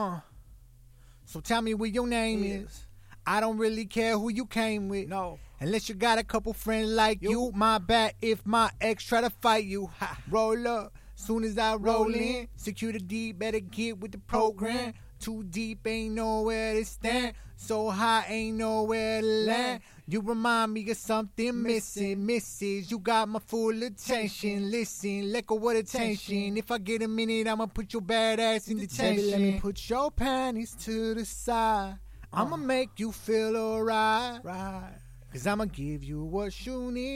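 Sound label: hip hop 97 Am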